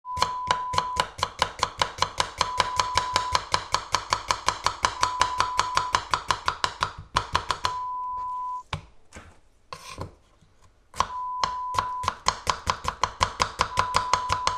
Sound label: Chopping fast sound